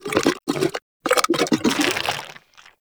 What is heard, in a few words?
Shrimp Idle; Creatures; Vocals; Baliq Shrimp Glade